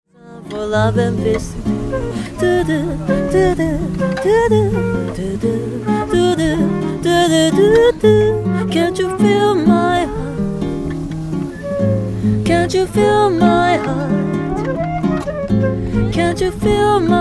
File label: heart beat